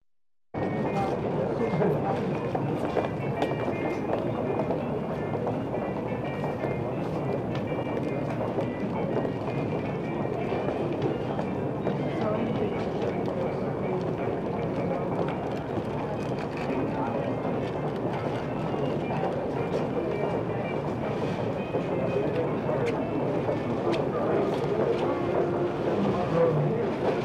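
sound in waiting hall of railway station passengers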